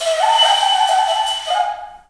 AF. FLUTES; Heart of Africa; Spectrasonics; Heart of Africa 1

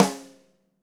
DW Green Maple Rim Shot Room; 1999 DW Green Maple Kit; One-Shots; Sonic Foundry (Sony Creative Software); Vital Drums

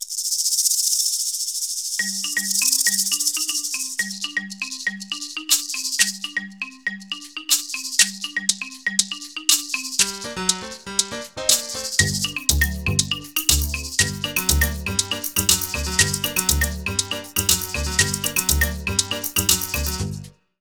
MARACASES, DEMO DRUMS; EdgeSounds; Drum Mashines VOL-1; DEMO DRUMS 2

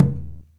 INDIAN PERC

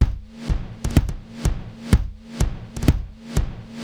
Akai XE8 (125BPM), beats; musicradar; cyborg beats

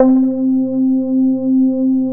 ORGAN, 170 BPM; Ueberschall; Drum & Bass Flash Trackz

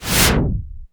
cinematic impact wipe

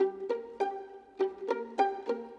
Violin Pizz, Orchestral; Sonic Foundry (Sony Creative Software); Orchestral VOL-2; Modern 32

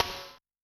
Snare Gate; Yamaha DTXpress-II

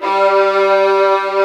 VIOLAS D2; AKAI S-Series CD-ROM Sound Library VOL-2

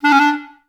D3, Advanced Orchestra; Best Service

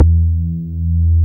BAS MINI, MOOG; Sound & Vision - Gigapack II CD 1

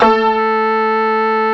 Pwang A4, Fairlight CMI IIe, Pianos

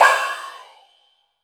crash R; Zero-G; Chemical Beats; CRASH ZOO

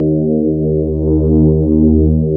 electric pianos; Wizoo Electric Pianos

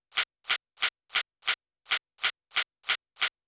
CLAP SCRATC, Techno 138 BPM; Best Service ProSamples vol.54; AKAI; DAVE CEE

